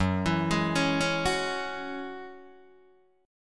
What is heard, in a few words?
Gb 7th Suspended 2nd Gb Guitar Open D